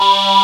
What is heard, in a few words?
AAH PULS, STRING CHOIR; Pro-Rec; Synthsonic